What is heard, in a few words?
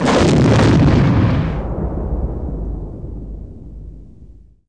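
combat explode bwing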